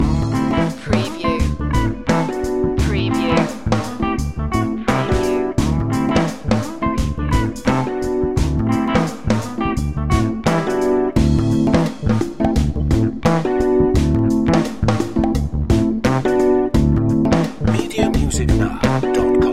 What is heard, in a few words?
groovy movie loop